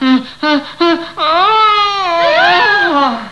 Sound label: Sound door